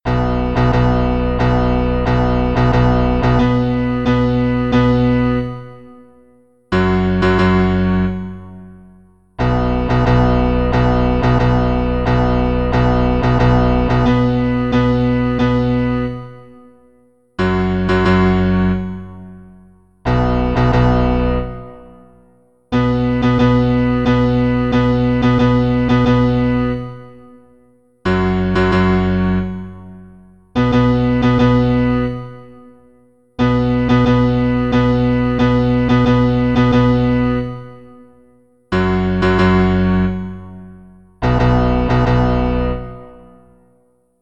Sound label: P Bass; Sendet Licht